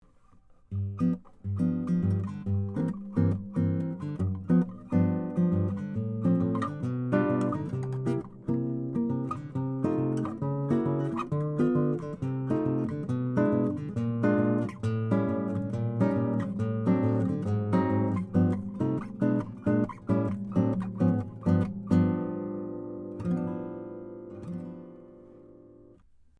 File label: Jazz